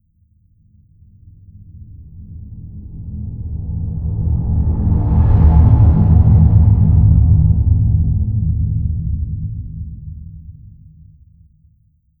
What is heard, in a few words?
Storm Gods Transition Swipe, whoosh sound effect